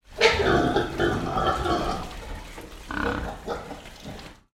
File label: pig grunt inside barn wet sloppy mud